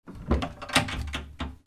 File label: DOORS